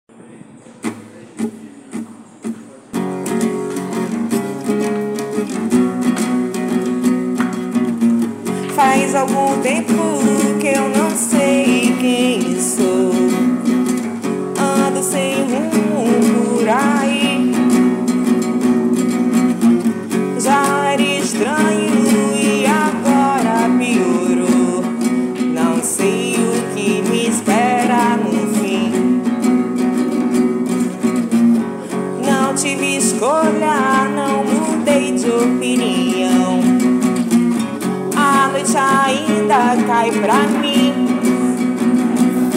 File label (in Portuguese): voz e violão